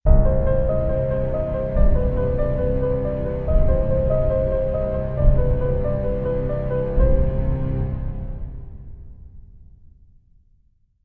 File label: Music Logo Fast Horr, piano